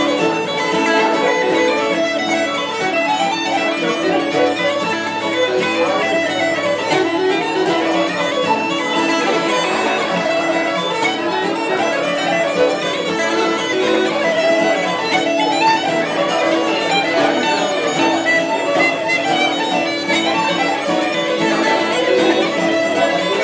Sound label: birthday music